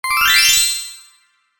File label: kirarin